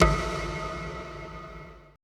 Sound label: AMBI FX